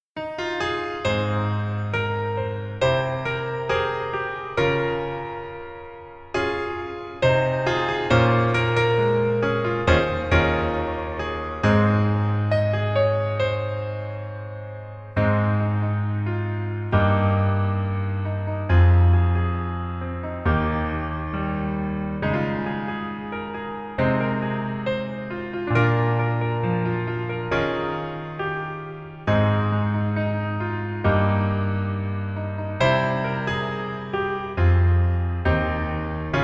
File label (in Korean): Piano ver, 멜로디MR